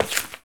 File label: Walking